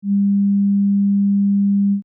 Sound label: oscill